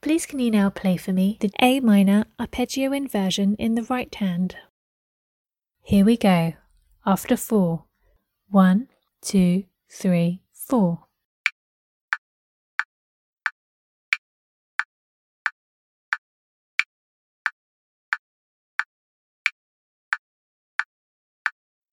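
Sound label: A minor arpeggio inversion right hand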